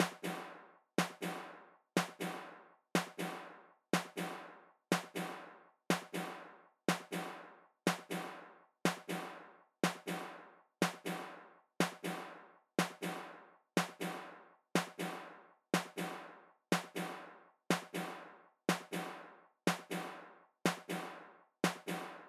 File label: 122 BPM Snare, drum; mmb drum arsenal vol 1